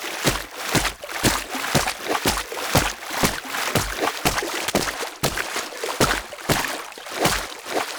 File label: Wall Climb Movement Step Water Loop; Warframe; Oraxia